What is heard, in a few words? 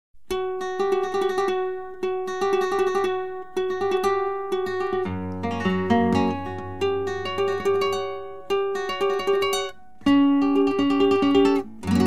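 Soleares, flamenco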